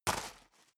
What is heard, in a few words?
step boots gravel medium single right